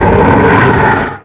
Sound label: attack; eidolon